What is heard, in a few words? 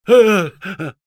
pain severe; (Gemido 1); Engineer